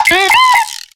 Grito de Bidoof

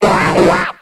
Grito; Psyduck

